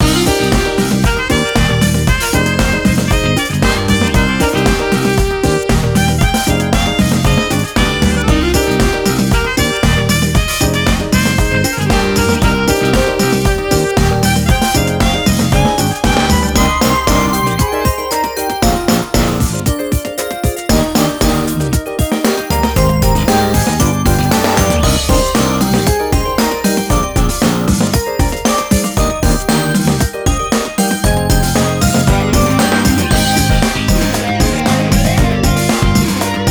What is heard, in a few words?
BGM Battle boss; Kenka Banchou 6 Soul Blood; 3DS